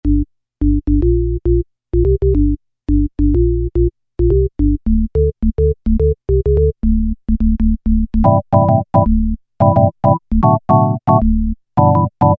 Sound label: Organ Bass, Korg MS2000; Factory Patches